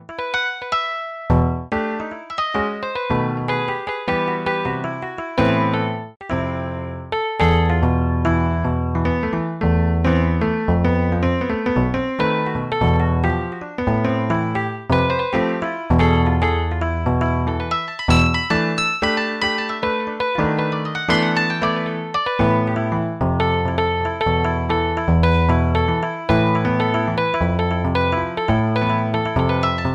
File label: rmi 368x electra piano